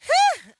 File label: heh; ooh ahh; heh huh